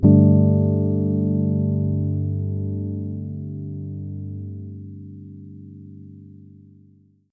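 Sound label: EPIANO Soft Rhodes Chord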